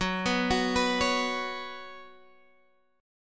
Db 7th Suspended 4th Gb, chord

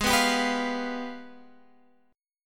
G sharp Minor Major 13th, Guitar Open G; 18,16,17,16,18,x

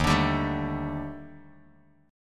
D sharp Major Sharp 11th, Bass Standard; D#M#11 strummed; JGuitar

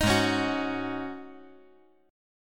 G sharp 7th Suspended 4th G sharp

